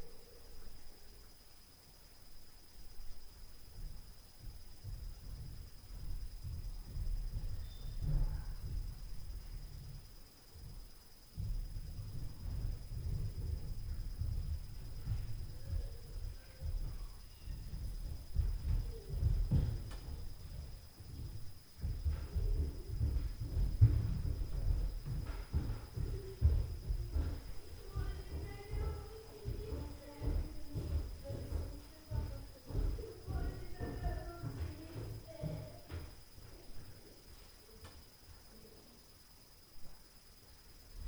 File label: Stairs Noise Door; Night Noise